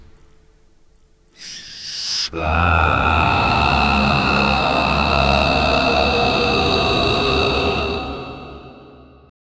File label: ultra realistic shamanic, magic